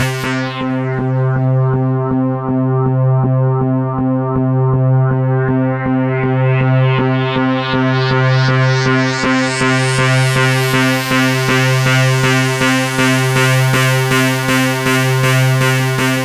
C3, SynthLine

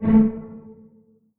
string pizz A2 pp; Sound On Sound - Peter Siedleczek's Orchestra

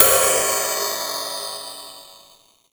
Big China Ride; Zero-G; Soundclash

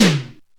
snare, AKAI XE-8; Greytsounds

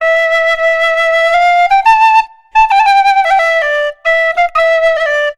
Penny Whistle, Celtic; Sonic Foundry (Sony Creative Software); Continental Drift Aftershocks

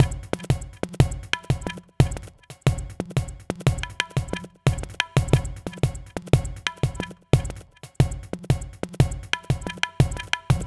Candy Machine, Processed Percussion

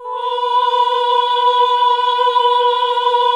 OHH B2, CLASSIC VOX6

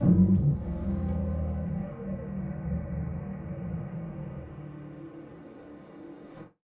Malfunction Synth, malfunctioning synth samples

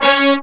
C#4 BRASS

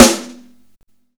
Snare Live, Drum and Bass; EZ Rollers; Instrument Samples

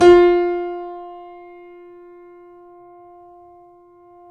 KEY, YC7 Piano ff

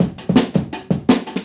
Jungle Breaks; Original Jungle Breaks

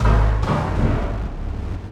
Ambient FX, Ambience FX

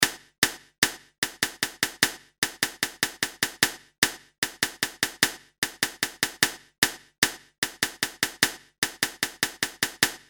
Cool Rim, snare; Industrial

